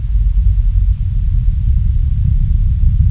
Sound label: rotor; gearbox; flier